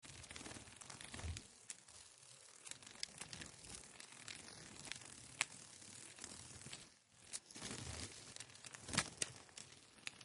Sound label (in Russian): myagkiy shelest kryilev babochki u mikrofona; nasekomyie